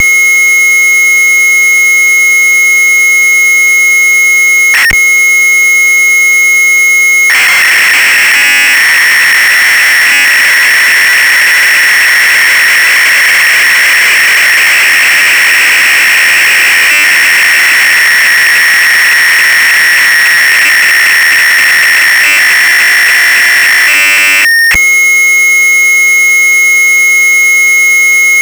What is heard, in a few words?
crazy 8bit